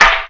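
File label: SD, snare drum; X-Static Goldmine 1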